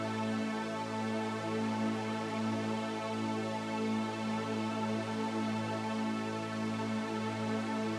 Drone; stereo toolkit samples; Non Tempo Loops

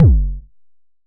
909 F#1, Kicks; Mbase 11 Kicks

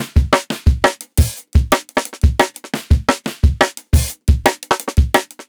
Live Jungle Drum Break, 175; JungleBreaks24

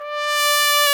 BRS, Tpt Solo; Bach; Roland L-CD702; Tpt 1-4 Solo

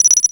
guiro, synth guiros; Zero-G Ambient 1

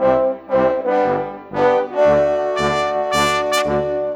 Brass, Orchestral, Rock Pop; Sonic Foundry (Sony Creative Software); Orchestral Series VOL-4; Rock and Pop; Rock-Pop 03

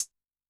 HiHat, Yamaha DTXpress-II

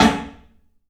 CLUB SNARE; 3D Sound; 3D Drums

